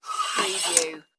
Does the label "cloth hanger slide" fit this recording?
Yes